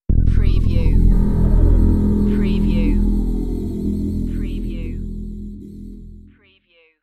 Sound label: Scifi Audio Logo Ident, Dark Beam, Concentrated, Classic